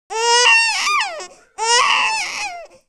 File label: Baby